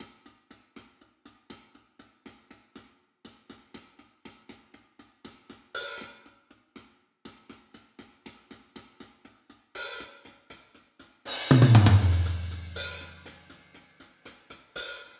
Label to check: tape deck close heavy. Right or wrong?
Wrong